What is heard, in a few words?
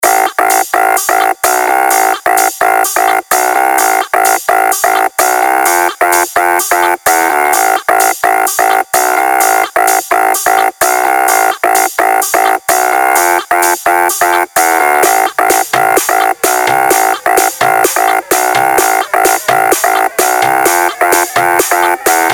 TRAP house mix